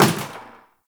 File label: Destructible Bucket Impact; Warframe; Ambience; NineteenNinetyNine